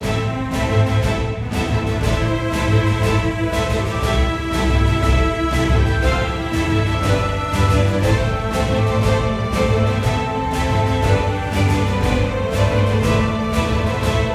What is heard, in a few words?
heroic forte